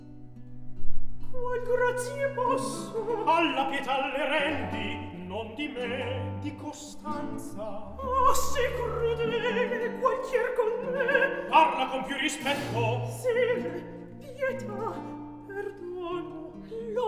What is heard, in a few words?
Recitativo; Atto II; Scene 14; (Griselda, Gualtiero, Costanza); Qual grazie posso